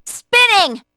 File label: spinning; p4u; aigis